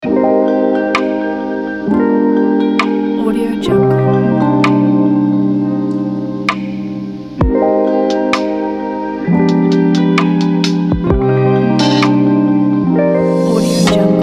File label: chill lounge beat